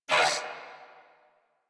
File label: Pain Grineer Male; New War; Narmer